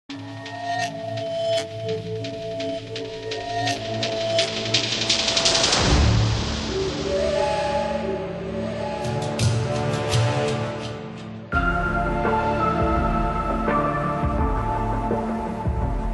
Music Underground Jungle

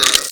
NPC Hit

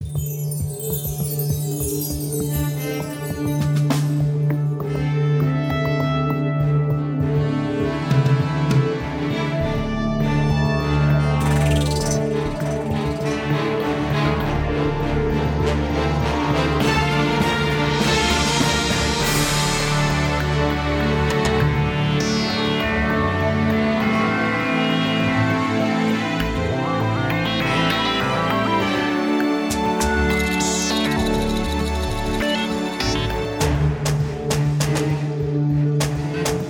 Music Title

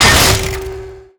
ice attack; Deerclops

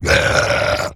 scream; Deerclops

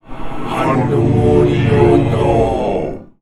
Zombie

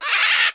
Zombie

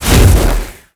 Zombie

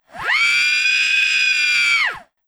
Roar

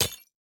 crystal impact

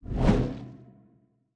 glaive impact ghost

